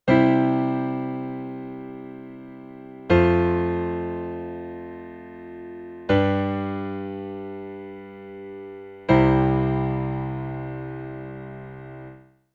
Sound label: piano just tuning